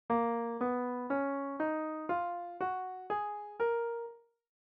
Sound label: Phrygian